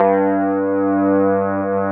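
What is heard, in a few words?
Wurlitzer G2, Keyboards Old School; USB Soundscan vol.09; AKAI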